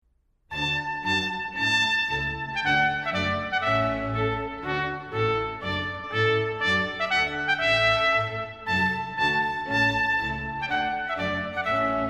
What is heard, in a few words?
Trumpet Tune in D; H Purcell; Non-Religious Ceremony